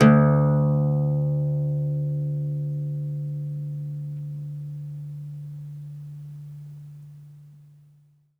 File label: OUD D1, Plucked Strings; E-MU Producer Series Vol. 7 – Old World Instruments